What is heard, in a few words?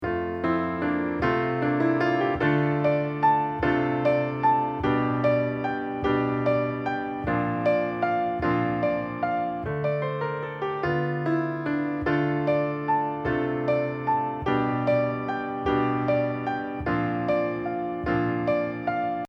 accomp; skye boat song